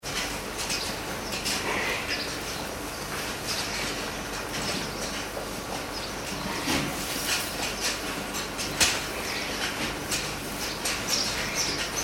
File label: ambience farm goats stall feeding; Farm Ambience - Goats Stall Feeding Inside Large Barn; Backgrounds